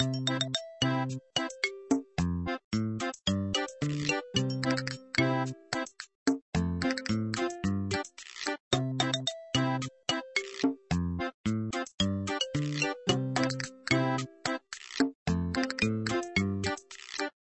Buildscreen Music; papas cupcakeria online windows gamerip 2013

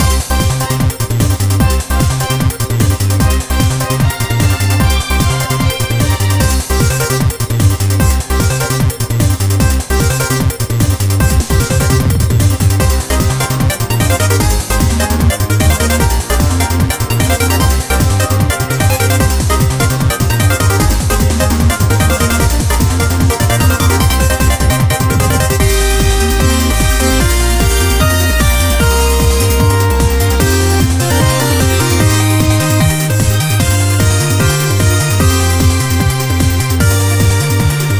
Battle; Ember Kaboom

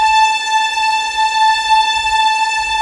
tremolo; Level4 Hidden World

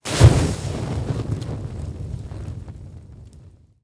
Arrow Launch Fire; Timelock VR

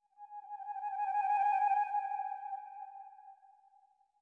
flute trill; Sky Children of the Light gamerip

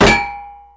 Impact Metal Hits

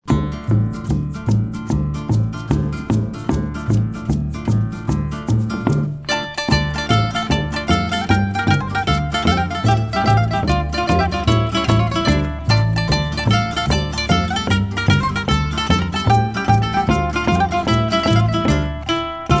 Jukebox Greek tarantella ukulele; Deep Rock Galactic; PS4 PS5 Windows Xbox One Xbox Series X|S

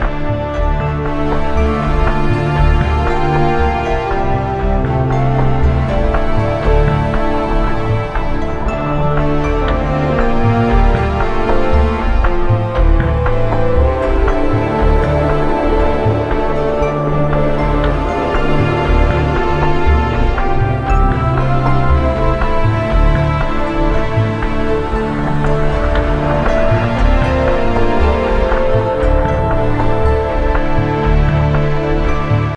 Loading; Kingdom Under Fire

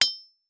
sword hit rock; a dump in the dark

